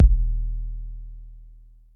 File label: kicks, Bass Drum